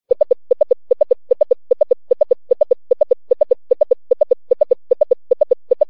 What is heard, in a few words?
gallop